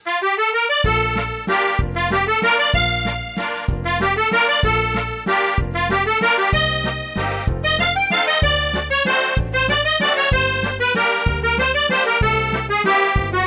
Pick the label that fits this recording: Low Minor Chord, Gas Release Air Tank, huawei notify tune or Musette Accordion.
Musette Accordion